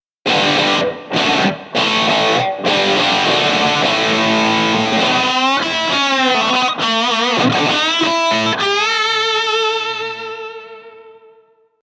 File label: classic stack; cube 30